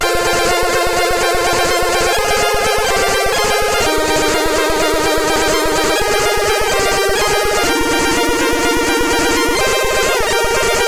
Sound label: High Trance, CSG8580R5